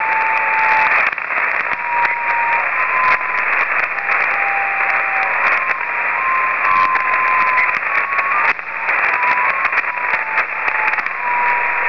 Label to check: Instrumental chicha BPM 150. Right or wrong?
Wrong